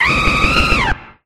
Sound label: cry